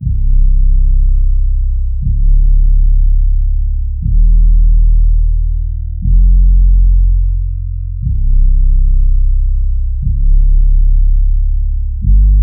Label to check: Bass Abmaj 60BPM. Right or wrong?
Right